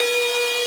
synth main

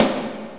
drum hit finish

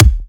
soft hit whistle

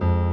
piano low

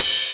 Ride; osu

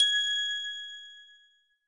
bell

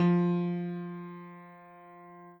piano

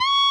bend full

